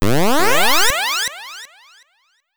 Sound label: warp